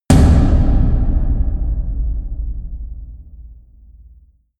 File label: huge tom hits; music elements; percussion